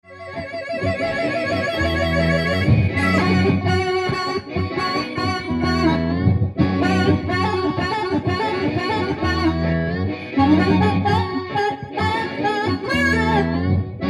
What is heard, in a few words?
loop jam